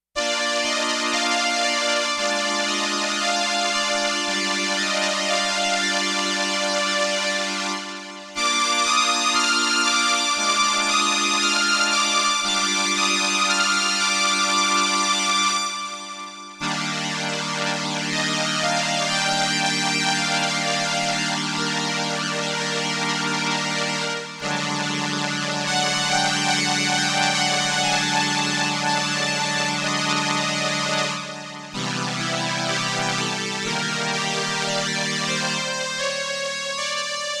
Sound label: STRINGS II with vibrato, sustain, and Ensemble I, Roland RS-202